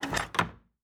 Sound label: Door Open